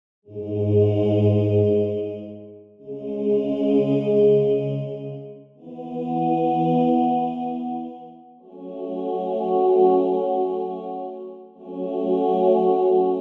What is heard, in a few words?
Choirs